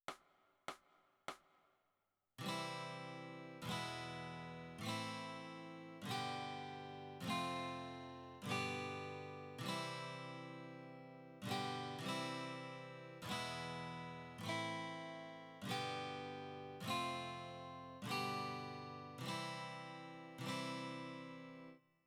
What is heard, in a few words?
Guitar